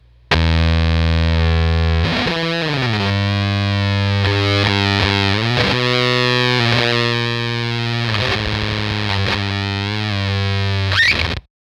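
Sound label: Fuzztest guitar fuzzfactory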